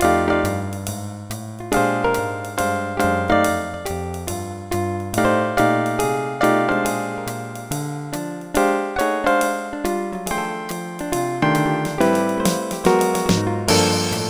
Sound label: solo; Dr. T's MIDI Recording Studio